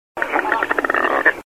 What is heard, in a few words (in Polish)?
bielaczek, głosy; ptaki